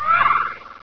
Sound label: Ray Enters; Mystery Box 2.0.1